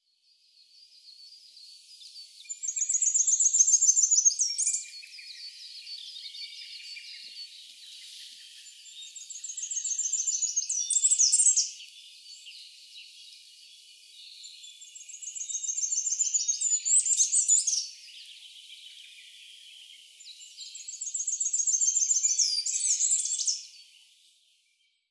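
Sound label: Goldcrest; koe; kikuitadaki; nasushiobara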